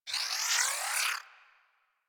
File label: Charge Up, Weapons; Warframe; Moa Pet; Vulcax